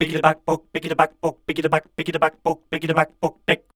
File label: SCAT #D, Voice